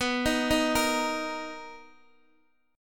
B Major 7th B down Guitar DADGAD

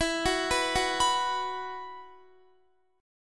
E Suspended 2nd E, Guitar, 4E,4A,5D,5G,5C,6F; arpeggiated; Esus2; x,19,16,16,18,18

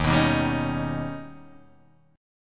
Eb Augmented 7th, Guitar, Open D

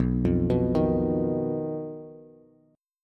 B Major Flat 5th B Bass; BMb5; arpeggiated

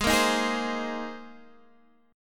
Bb 7th Suspended 2nd Suspended 4th

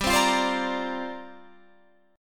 C 7th Suspended 2nd Suspended 4th, G

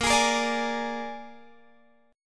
A sharp 7th A sharp, Guitar, Open G

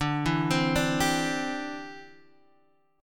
D 7th Suspended 2nd Suspended 4th; arpeggiated

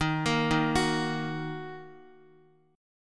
D sharp Minor, Guitar; arpeggiated

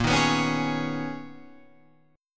B Major 13th A sharp, guitar

